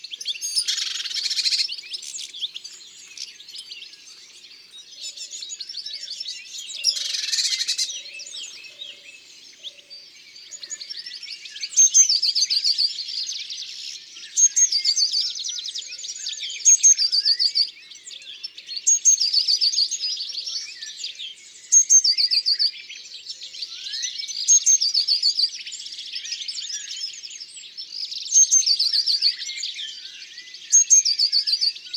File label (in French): Mesange bleue, chants d'oiseaux; ABC des chants d'oiseaux